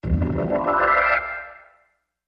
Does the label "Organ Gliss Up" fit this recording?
Yes